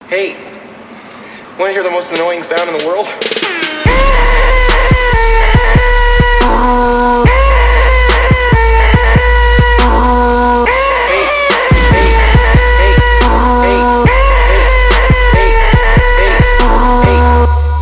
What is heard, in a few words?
annoying trap remix